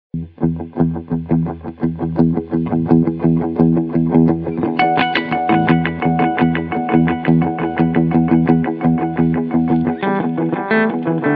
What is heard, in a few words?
Texture